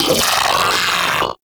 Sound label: Arachnoid Vocal Player Spotted; Warframe; Corpus; Arachnoid Hunger